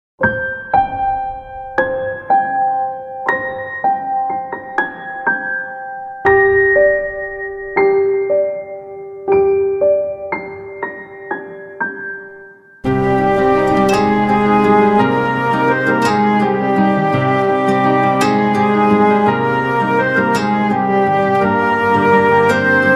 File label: Mobile Ringtone